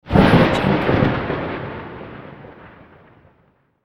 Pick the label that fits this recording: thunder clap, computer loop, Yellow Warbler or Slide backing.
thunder clap